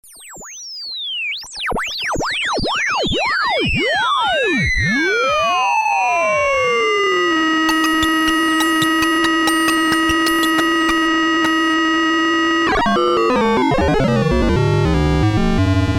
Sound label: Ring Mod FM